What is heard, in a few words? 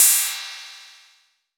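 cymbal Dark Krasha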